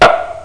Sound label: Ball Hit